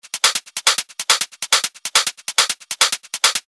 140 BPM, techno loops; Freiheit; revealed techno top loops vol 8

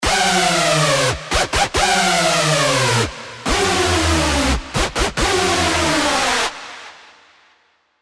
Hoover; Spire; Gala; revealed spire mainstage drops

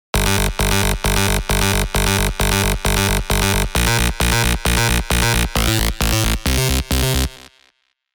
Rolling Tech, Spire; alonso 18 year anniversary pack

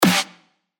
Snare; Slushy; revealed claps snares vol 2

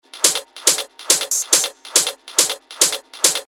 140 BPM, techno top loops; Infomercial; revealed techno top loops vol 8

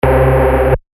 C, bass shots; Alien; revealed bass shots vol 3

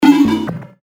percussion; Frontal; revealed percussion vol 2

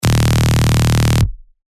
BSHOT D#; Cerberus; revealed bass shots vol 3

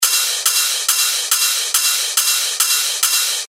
techno loop 140 BPM; Borealopelta; Revealed Techno Top Loops Vol. 9

